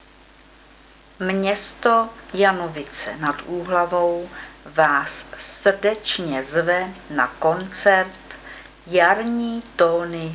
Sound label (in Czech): Klarinety; koncert "Jarní tóny s klarinety"; Janovicích nad Úhl.